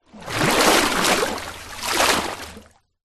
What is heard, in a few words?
nature water movement